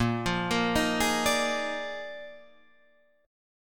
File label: Bb 11th, Guitar, Drop D